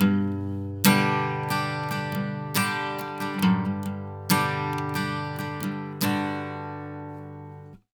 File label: 70 MAJ CHD G, Acoustic Guitar Loops; USB Soundscan vol.04; AKAI